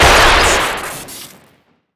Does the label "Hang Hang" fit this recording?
No